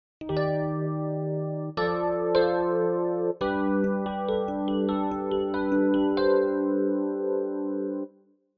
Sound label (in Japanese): エレピ